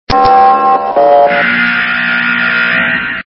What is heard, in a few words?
station buzz; active911